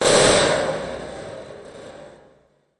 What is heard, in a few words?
Hollow Static Blast